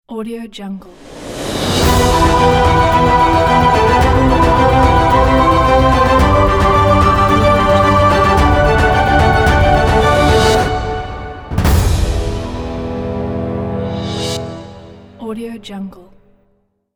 epic logo; 5dollar-music

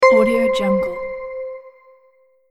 radar ping

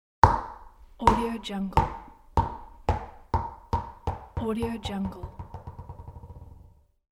small ball bouncing

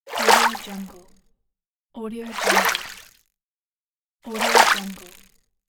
fishing rod pull; sounddivision

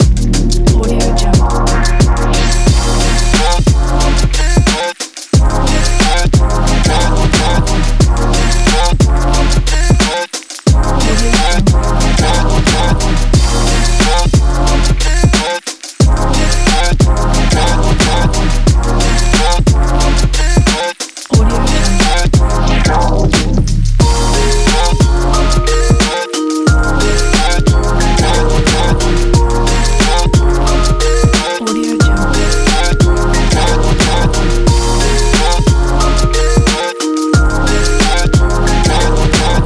fashion hip hop beat

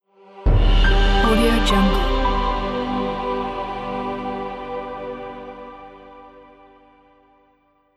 cinematic opening logo

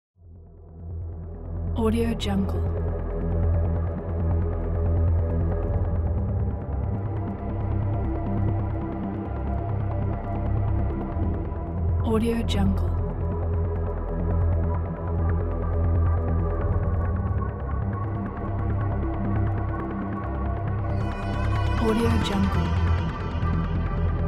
disturbing cinematic atmosphere

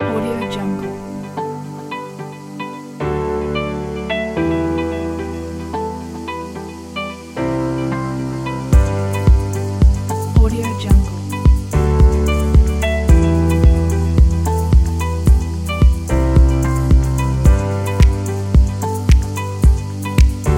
beautiful background ambient

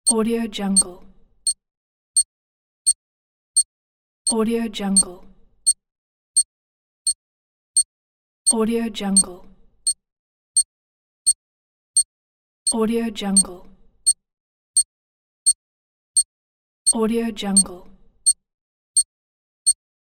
countdown beeps bomb

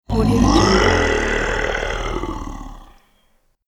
troll fantasy monster roar

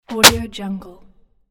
newspaper drop hit table

